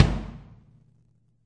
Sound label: real kick; A Real Freakin Drum Kit